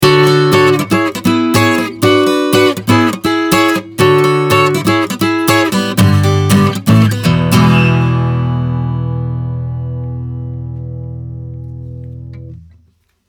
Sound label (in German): Blueridge BR-43 Plektrum